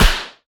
impact, Wolf

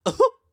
Jump; Collector

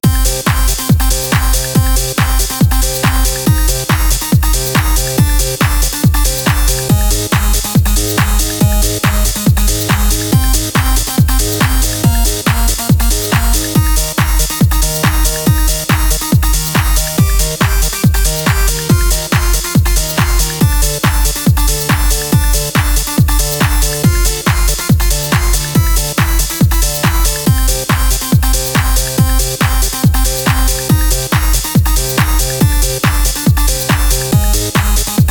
Techno music for adverts; JukeBox Techno bcc-techno-music-for-adverts

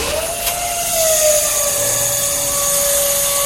Lift Loop

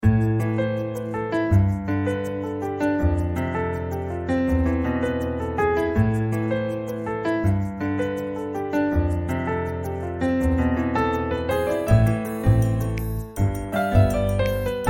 Ambient - Relaxing

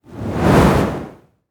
fireball large flame burst

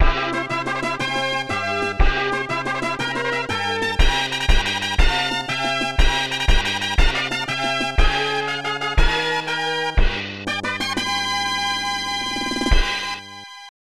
Race Start Fanfare

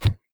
thick plastic soft

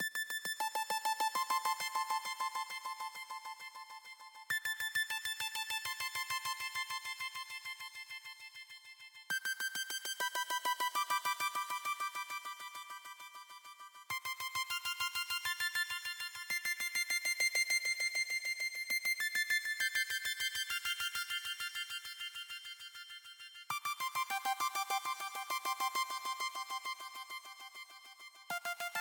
Melody Synth

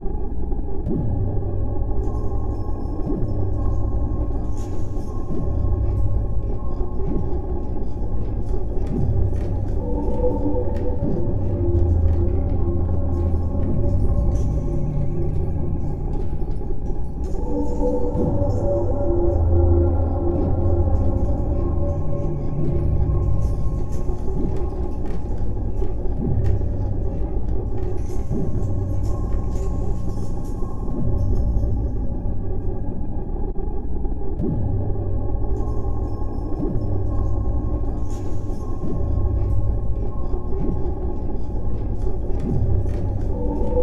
Cogger Factory ambience; fate 3 the traitor soul

